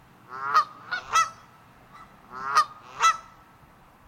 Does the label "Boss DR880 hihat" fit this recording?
No